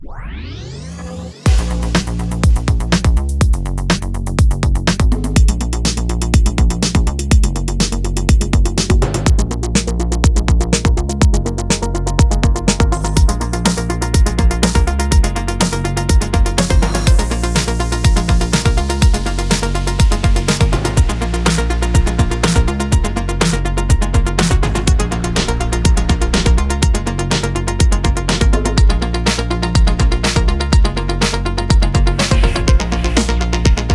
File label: Drum samples